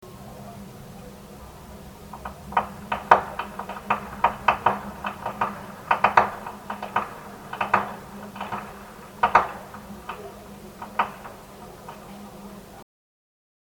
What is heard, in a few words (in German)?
schnalzen; jugend; perach